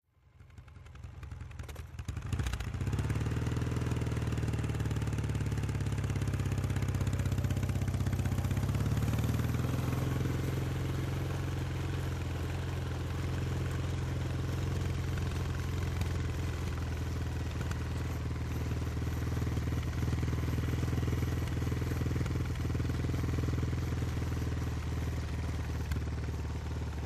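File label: Motorcycle Norton Saddle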